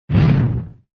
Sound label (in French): torche